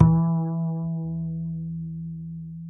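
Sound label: acoustic bass vibrato E 3, right; Masterbits CLIMAX 7 - Bass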